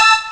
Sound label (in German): geige; Amiga Soundtracker Sample Packs